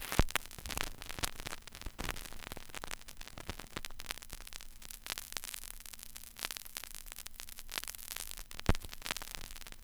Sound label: vinyl crackle; 99Sounds; Vinyl Noise SFX